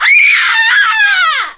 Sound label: headshot death, witch voice; npc; die; source engine; left 4 dead 2